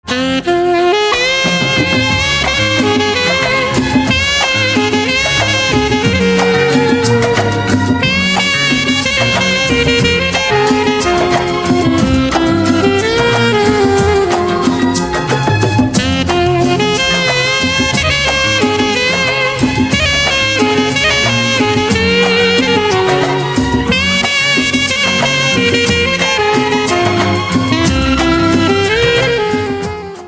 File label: Saxophone